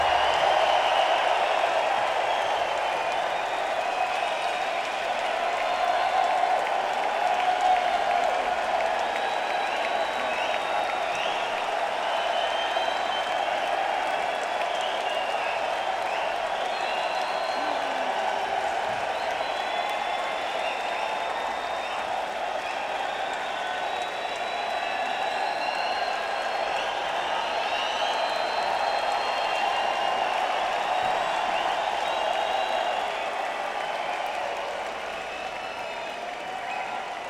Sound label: Crowd; Holmdel, NJ; PNC Bank Arts Center